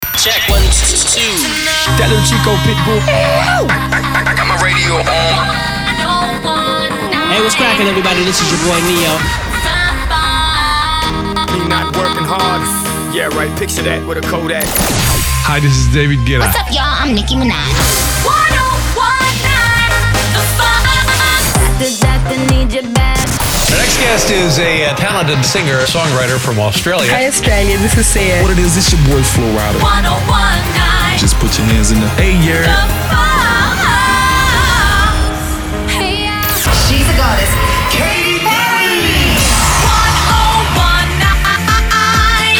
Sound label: some sung intros